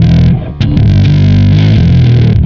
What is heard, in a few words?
Bass, Distorted; Bass X